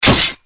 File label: trap activate; granny; zerosiki; slender